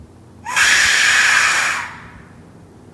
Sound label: windmill monster outdoors; THE WHIMSICAL WINDMILL